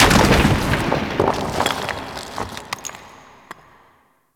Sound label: Rock Fall Medium; Ambience; Gameplay; Warframe; Orokin Moon